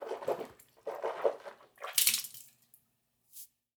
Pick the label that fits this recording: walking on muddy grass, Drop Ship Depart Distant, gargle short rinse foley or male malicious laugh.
gargle short rinse foley